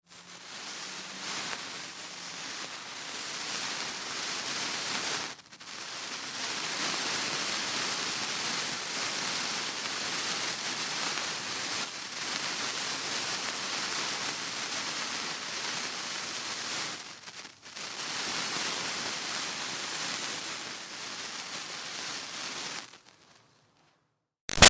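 Fire Sparkler Burns Sizzle Steady Extreme Close Up; Fourth of July; Big Room Sound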